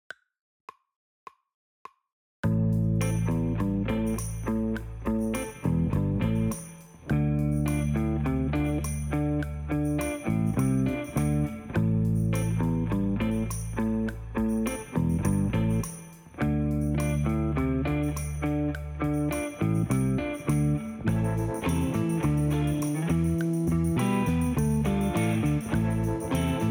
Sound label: sugar Foam drumless